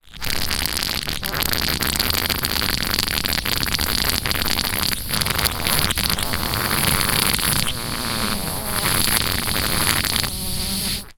foley balloon deflate wet lip air hiss squeak bubble; zapsplat